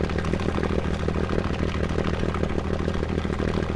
me109 cockpit, engines; War Thunder

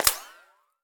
bullet hit inside

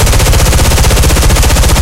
m3 loop, guns

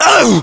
pain

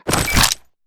Battery Boop; Titanfall 2

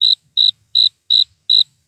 Amb Animals; Red Orchestra Ostfront 41-45

